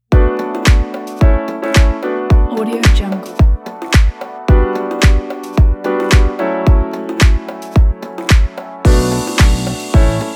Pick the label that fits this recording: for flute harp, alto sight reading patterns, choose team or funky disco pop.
funky disco pop